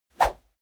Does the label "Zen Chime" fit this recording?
No